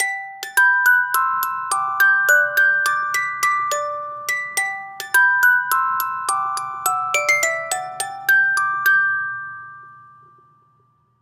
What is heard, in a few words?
Music Box; Assassin's Creed Syndicate